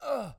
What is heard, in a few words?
man ugh; angband